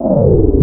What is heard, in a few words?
INST FX, Ragga & Dub Reggae; USB Soundscan vol.27; AKAI